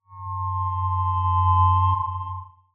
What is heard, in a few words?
attack; Barotrauma; Legacymoloch